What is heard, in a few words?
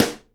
snare GLASS, Live Snares